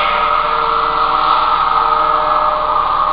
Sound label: crowd, speech; OctaMED SoundStudio V1